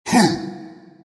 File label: arc pain; Zuus